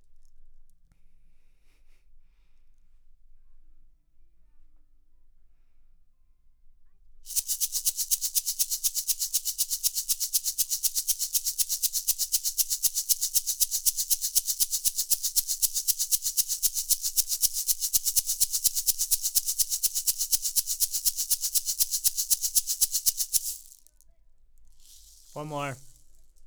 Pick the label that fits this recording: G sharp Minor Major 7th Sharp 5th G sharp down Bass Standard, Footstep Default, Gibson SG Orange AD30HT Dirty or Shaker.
Shaker